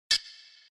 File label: computer click sound